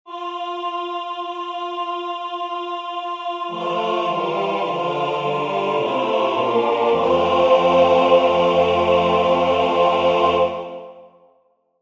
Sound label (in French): Tutti; Agur Jaunak